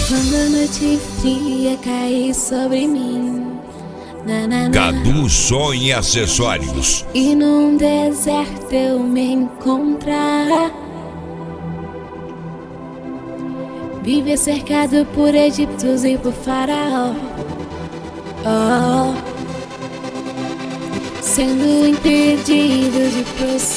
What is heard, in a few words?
gospel